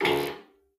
light tube on

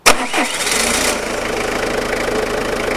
diesel starting, Vehicles Engine; civ13; civ14

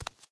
hard barefoot, Footsteps

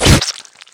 severed, Gore; civ13; civ14

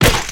alien claw flesh

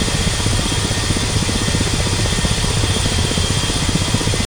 ka52 engine, Aircraft; civ13; civ14; Vehicles

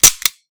empty, guns; Weapons

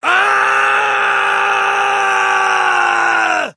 scream, Screams

